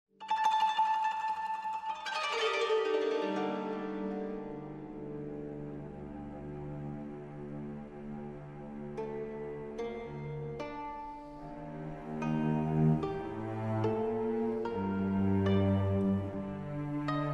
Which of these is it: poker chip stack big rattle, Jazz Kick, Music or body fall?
Music